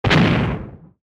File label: Blow; Troll Face Quest Unlucky; Android